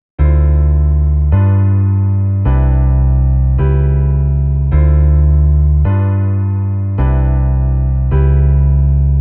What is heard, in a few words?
passing half dim vi